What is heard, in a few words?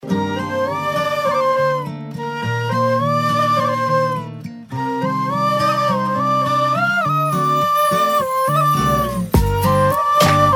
Music Tone